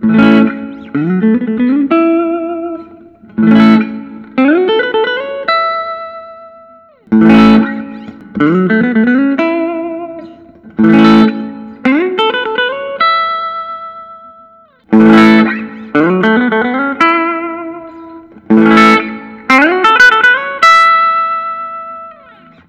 gibson custom sg standard reissue, guitars electric solid body; vintage cherry; Gibson Custom Shop VOS SG